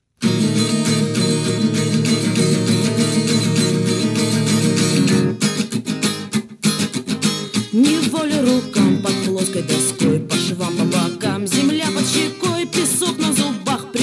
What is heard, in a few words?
Reggae